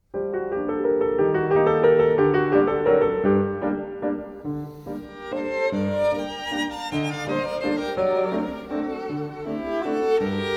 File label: bgm